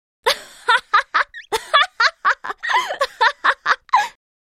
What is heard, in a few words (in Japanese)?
（笑い声）; エモーション（笑う）